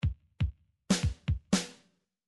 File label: midi drums drums fill